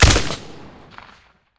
ak103 silencer stereo, weapons; STALKER; IWP

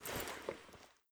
toz66 holster; bm16

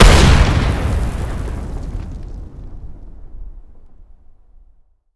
explosion distant, grenade

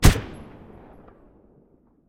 beretta silencer mono distant

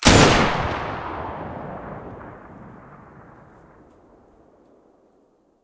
muzzle pkm mono, weapons

